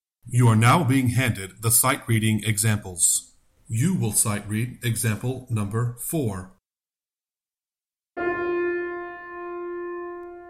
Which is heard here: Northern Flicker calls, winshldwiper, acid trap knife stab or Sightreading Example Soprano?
Sightreading Example Soprano